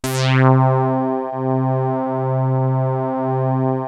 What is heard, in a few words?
FAT SAWZ; MORPHEUS